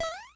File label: mini jump; nsmb; mini mario